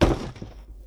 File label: Large Cardboard Box Wood Hard